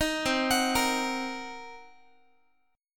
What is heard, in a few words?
C Minor 7th Flat 5th C down Guitar; Cm7b5 arpeggiated; JGuitar